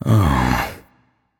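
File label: sigh, non-verbal; voicepack; smite; merlin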